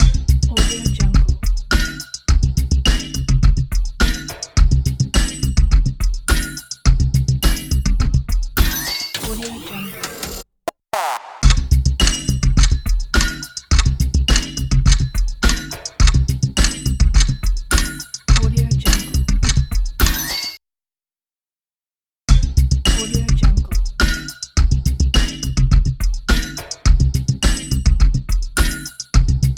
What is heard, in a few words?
fashion ident